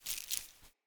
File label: Grunt Foley Fill; Enemy; Characters; Corrupted Sproutfolk